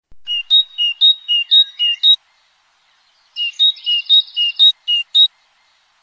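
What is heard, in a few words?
bird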